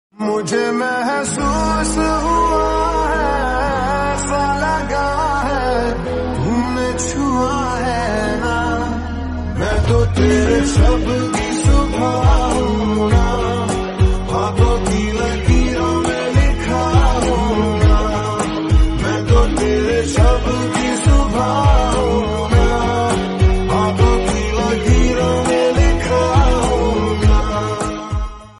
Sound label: sad ringtones; 1920 london